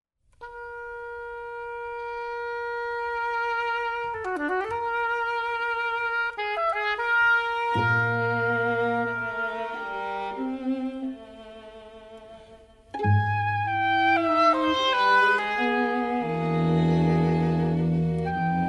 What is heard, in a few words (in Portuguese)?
oboe cordas